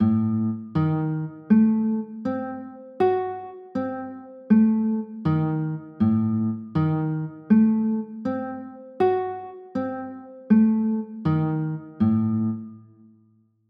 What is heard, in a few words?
d sharp dim7, chords